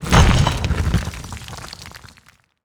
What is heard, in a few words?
large rock split